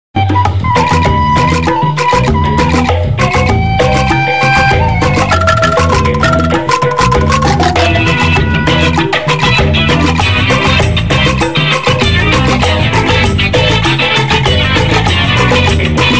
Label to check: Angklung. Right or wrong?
Right